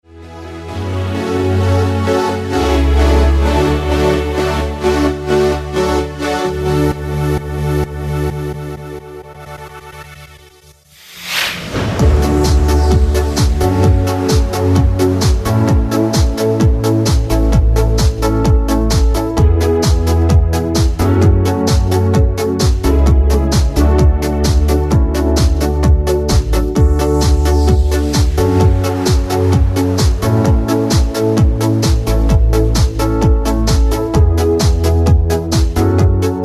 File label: dance music